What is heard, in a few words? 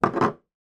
household medicine bottle place down on table